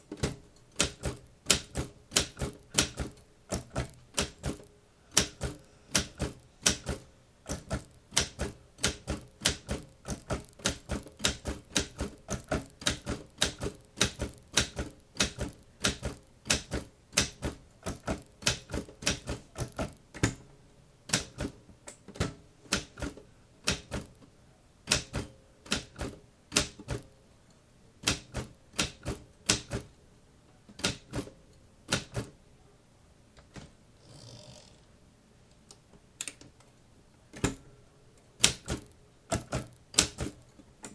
typing with love

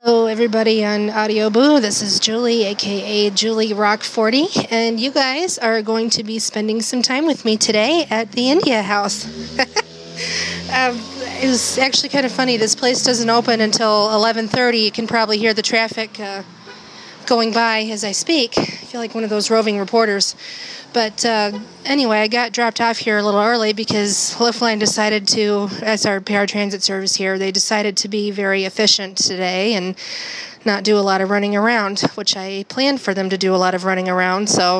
outside the india house restaurant

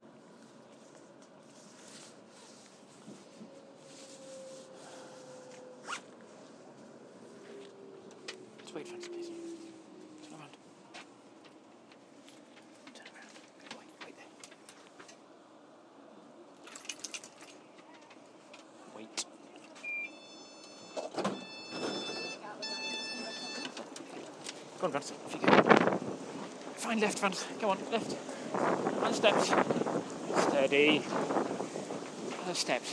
crossing London by train and tube; off a train from yorkshi

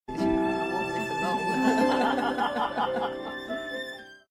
laugh

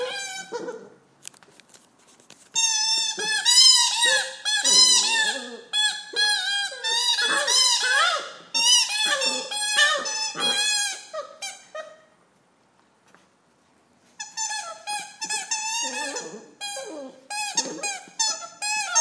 puppy attempts congress with squeaky ball

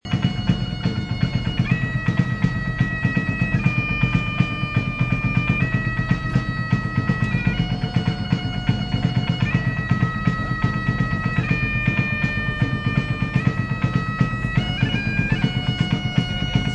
drums

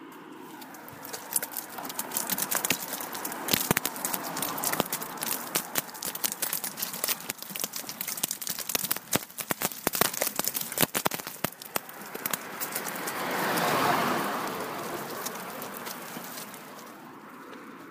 cracking beech nuts with my wheelchair

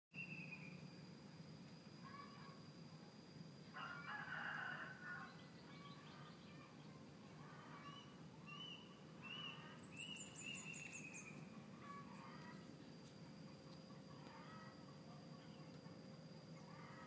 rooster

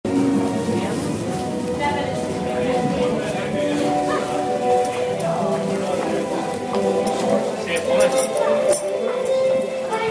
classical busking

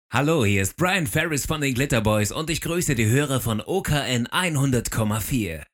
radio jingel; radio okn; glitterboys

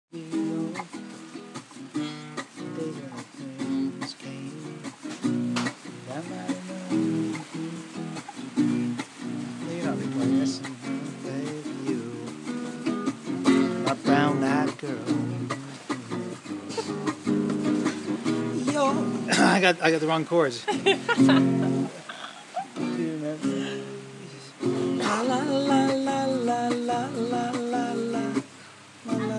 singing to heritage wheat in the rain

